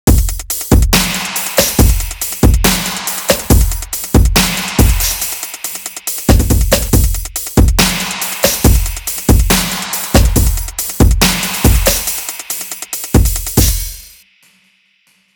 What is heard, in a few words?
edm loop 140 Fm